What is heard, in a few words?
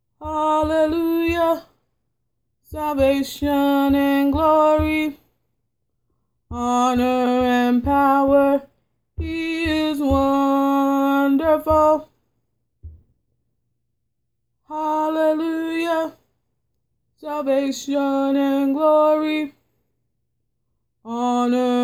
tenor